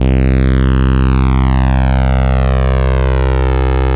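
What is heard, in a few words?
ARP lead C1; Sonic Reality; Vintage Timetraveler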